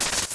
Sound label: Money Increased; Open Zelda; Quest Designer 2.0.4b